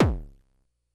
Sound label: kick, Drum Machines, Korg DS-10